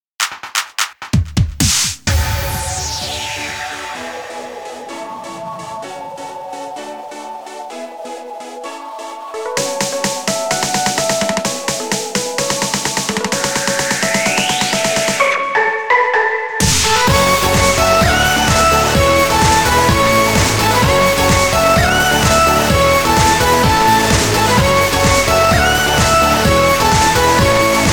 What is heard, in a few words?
inst